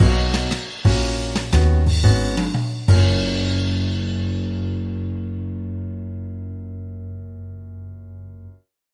Laid Back Jazz Music Theme Stinger; bbc

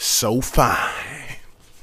Rap Vox

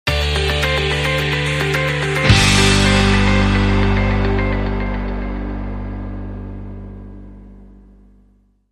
Positive Message

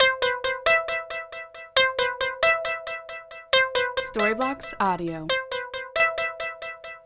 Ambient House Melody 136BPM C

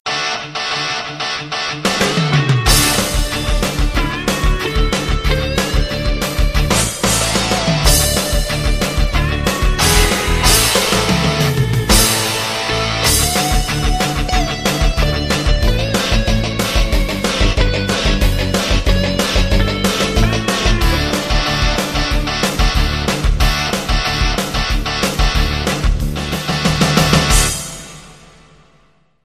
grunge spangled banner

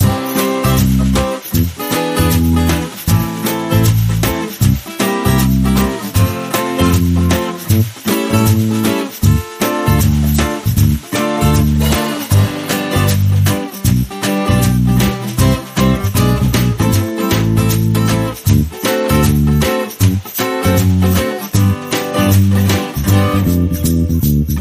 happiness loop

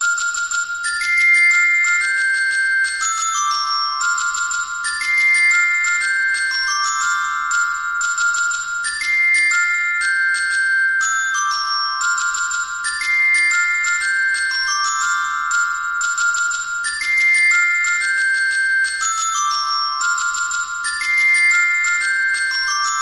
here we go round the mulberry bush glockenspiel; bbc

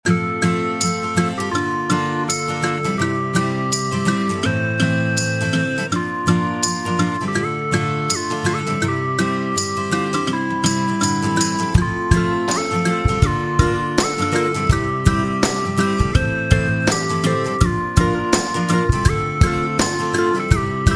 happy pop

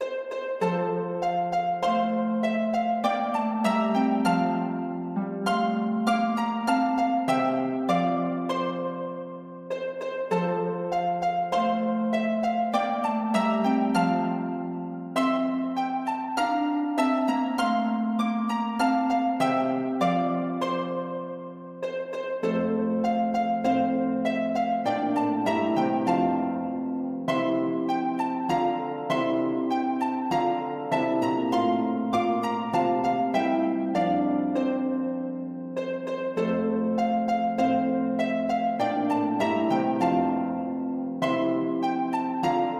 The Twelve Days of Christmas Harp Version

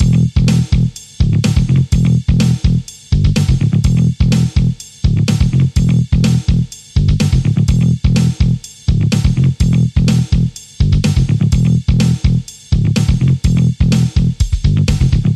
extreme rock guitar loop

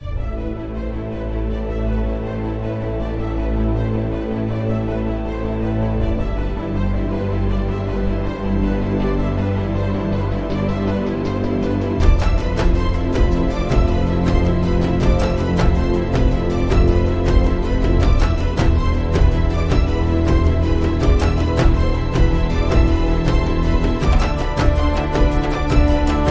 Alt Percussion

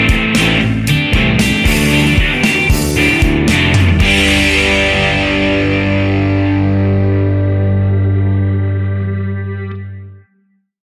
pop rock king stinger; bbc

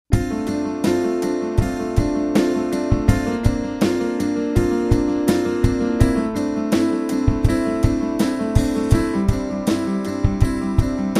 piano in flight

